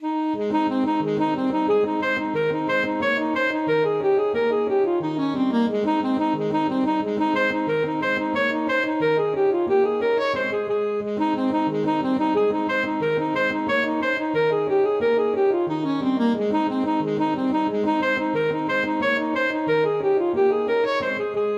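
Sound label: hornpipe, alto saxophone; cincinatti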